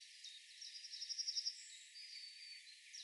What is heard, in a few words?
birds 1shot; animal